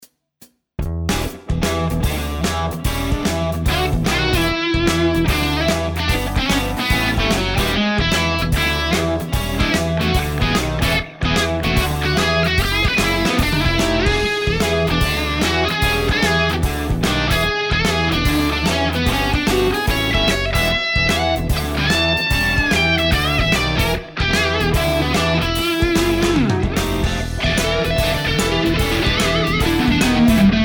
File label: Snapper test medium gain; pedals-amps